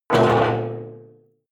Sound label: metal impact metal rattle drop